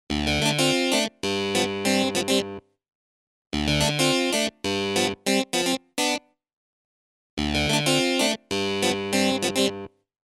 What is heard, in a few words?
Roland MKS-10 Planet-P clavi chorus